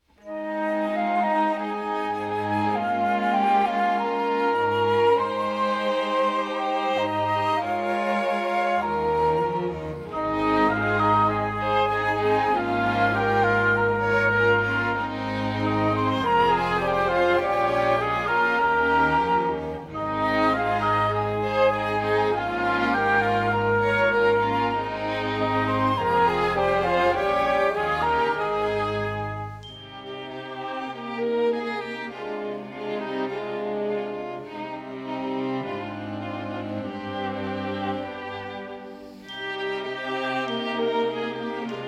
orchestre; petit prince

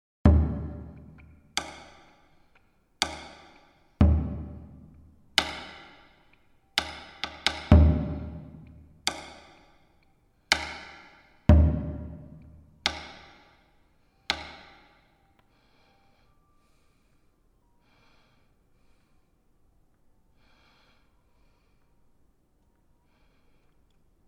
tambour; celle qui fait tout mon tourment airs serieux et boire h450; chantons baroque

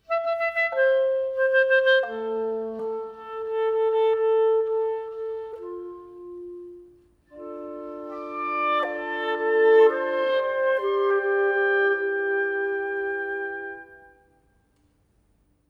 instrumental melodie

